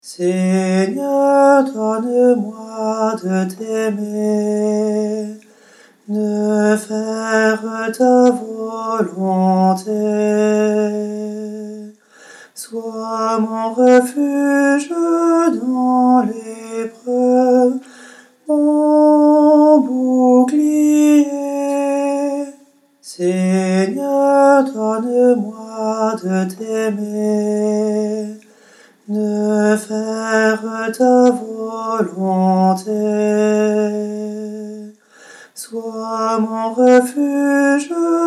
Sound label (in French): seigneur mon bouclier tenor; choeur d'hommes